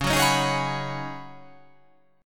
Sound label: C sharp 9th Suspended 4th C sharp down, chord